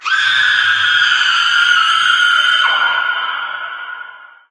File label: female scream; Source Engine; tf